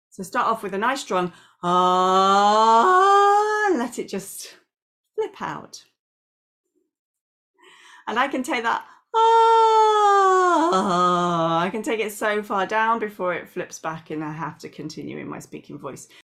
Listen to this vocal slide with flip between two voice types